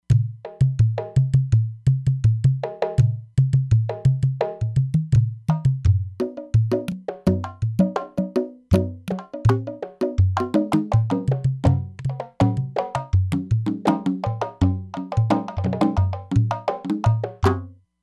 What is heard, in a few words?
Bata Drums, E-mu Proteus-3; Factory patches